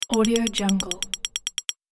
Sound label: text digital readout